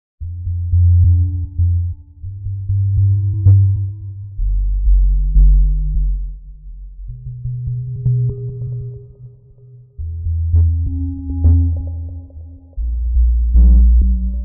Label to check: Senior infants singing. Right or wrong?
Wrong